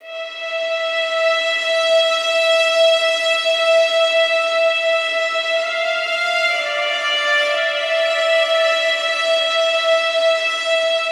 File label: Violin Am 65Bpm; Construction Kits; STEMS; Ghosthack; SkyRealm; Kit 30 - Darkest Hour